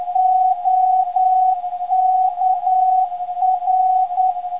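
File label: non-directional beacon; OKR; 389kHz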